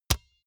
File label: basketball single hand catch hard